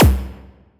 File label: Kick; ModelCycles